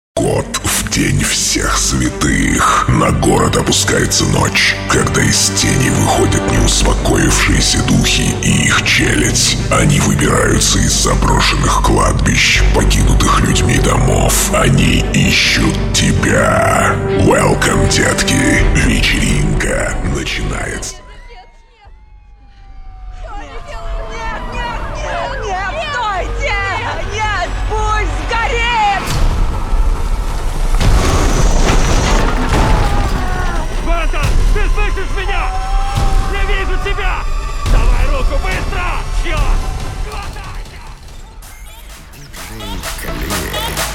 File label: HALLOWEEN LATIN TRIBAL 128 BPM